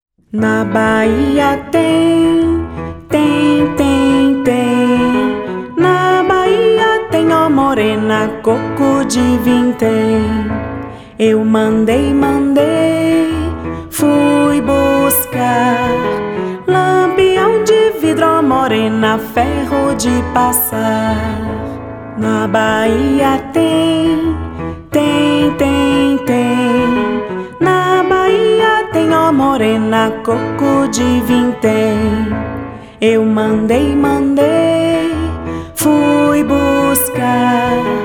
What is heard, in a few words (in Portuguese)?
(Vocal); Na Bahia Tem; Violino para os Pequeninos